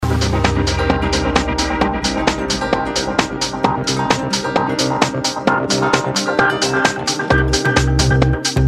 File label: karaoke